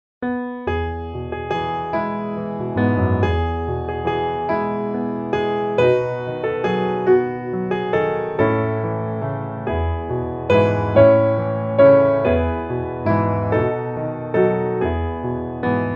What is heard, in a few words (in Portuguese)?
Em meu coracao piano